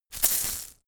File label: insect shaker hit double hard